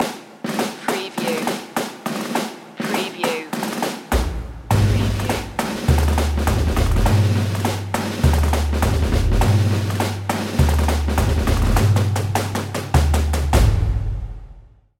tattoo drumline

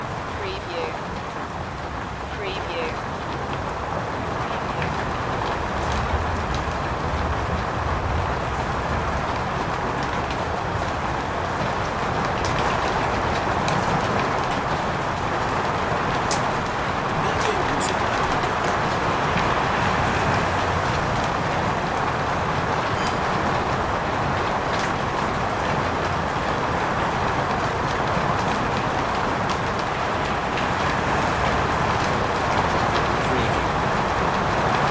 Heavy Rain Shower

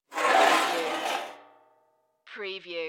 Folding Chair Pick Up

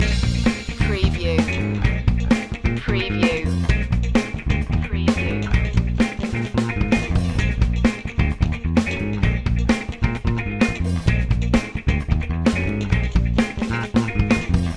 busy funkster loop

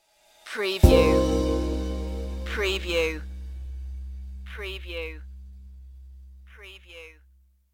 jazzy chill stinger

